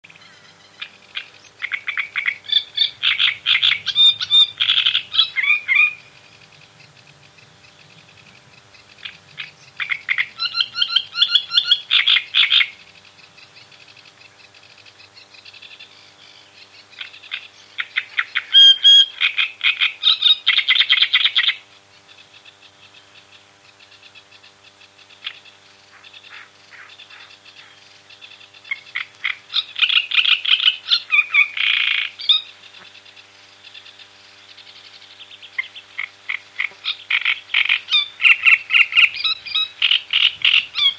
drozdovidnaya kamyshevka golos

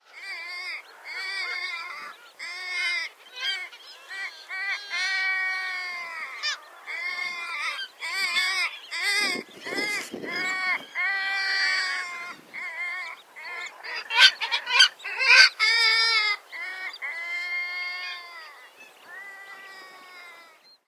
moevka golos

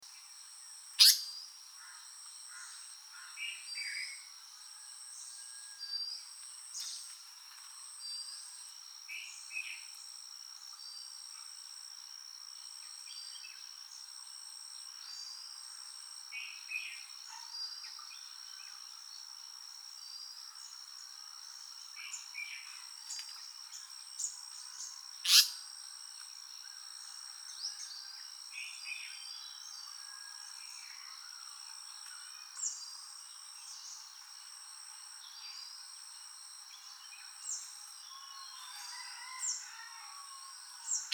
rayskaya muholovka golos

